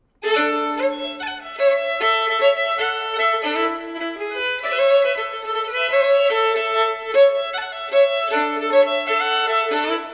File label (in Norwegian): Norsk reinlendar; e Johannes Nord Skaret